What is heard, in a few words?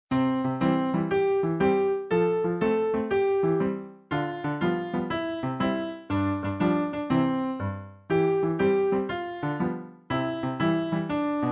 Instrumental